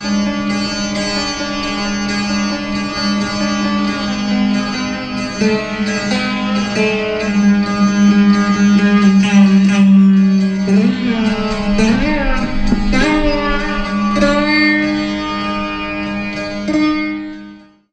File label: Vina